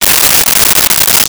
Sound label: Paper Wad Up; Office Supplies; 1001 Sound Effects